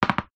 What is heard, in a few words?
sport tennis balls x2 bounce on ground fast